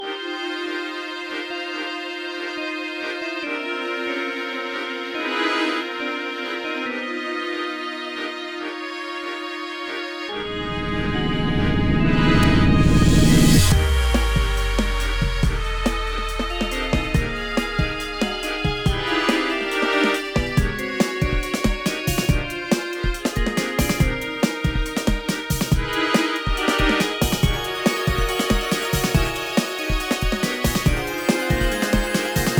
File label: battle theme